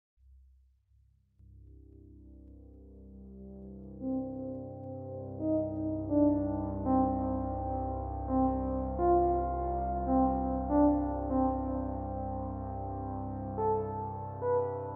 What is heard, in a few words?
drone